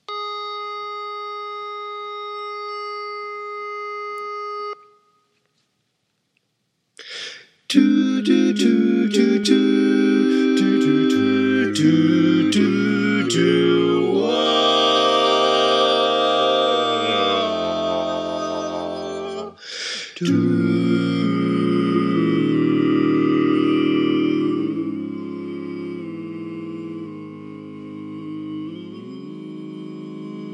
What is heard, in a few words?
Baritone